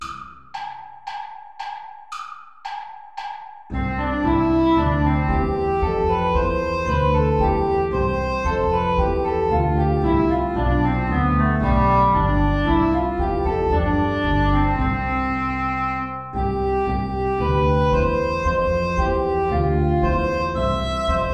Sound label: air play along without guitar; bach johann sebastian